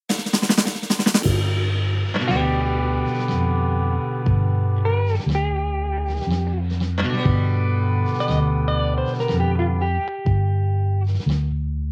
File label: bolero blues